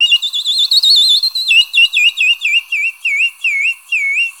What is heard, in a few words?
BAMBOO, World Whistles